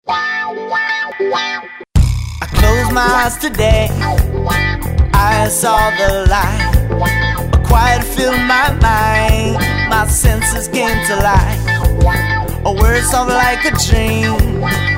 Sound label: REGGAE ROCK